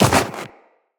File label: Run Snow, Footsteps; Tenno